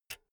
pull out jack cable; objekte; elektronik

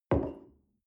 coffee place table soft

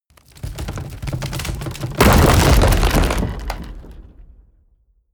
wood medium crash; industrie wirtschaft; baugewerbe

